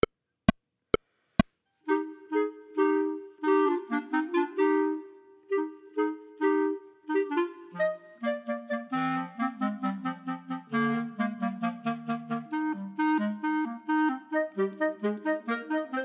Allegro Chamber Music for 3 Clarinets minus 1st part; Haydn